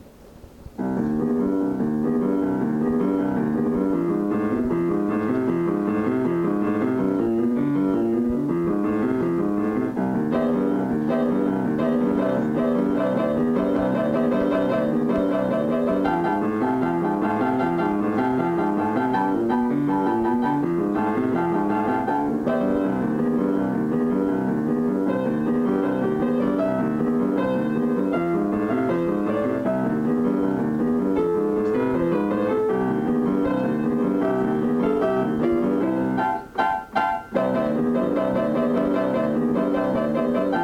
recital

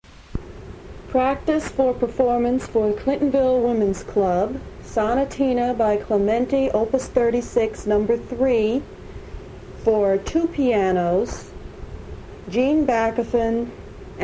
Clementi mvt 3 Duo pianos; Sonatina / Opus 36 # 3 Mvt 3

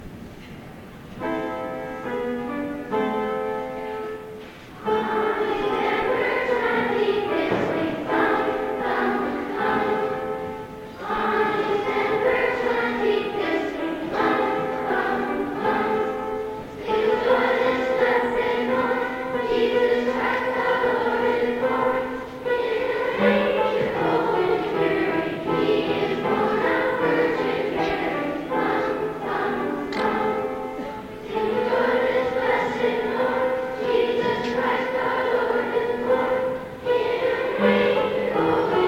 piano

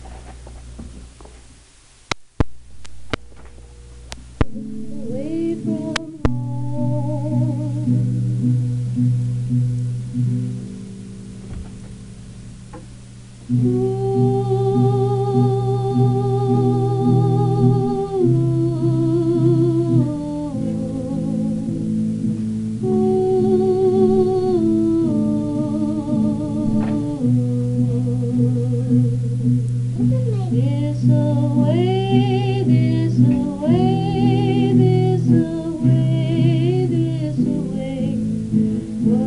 alto part with guitar

